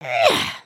generic build

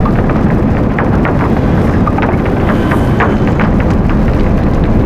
aura drill, ambience

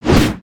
Mole Whacker Swing

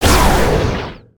super cannon fire; destructibles

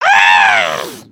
frustrated; emotes

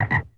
frog

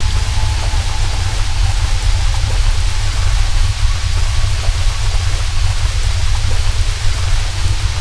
Ice Water

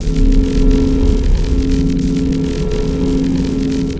Barrier wall

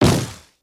landing

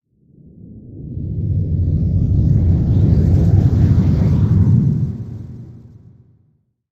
Creep Rumble; ambience

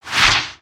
Light Whoosh; Valiant; aronwk collection; ndaudio

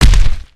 Creature Footstep

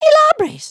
sway, emotes

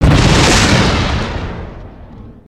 ship explosion; destructibles

